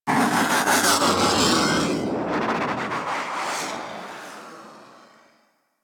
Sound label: Fighter Ship Attack Maneuver F, Space Fighter Ship Engine; Warframe; Railjack; Enemy Ships